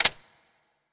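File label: Engineer Radiant Drone Click Launch Grenade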